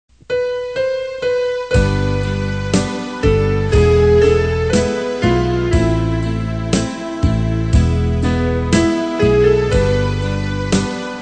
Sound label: BASS